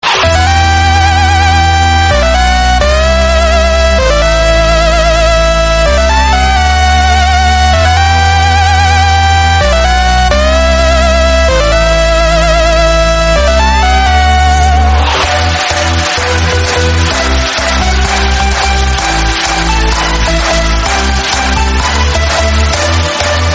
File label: edm tune